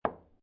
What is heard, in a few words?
Billiard Pool Snooker Put Ball On Table; Sports